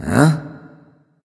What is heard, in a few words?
confused; chaac